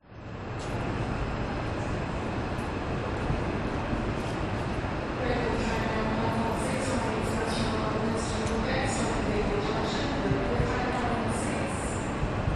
brisbane cbd train station central platform people female announcement trains